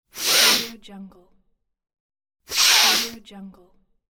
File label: big sniff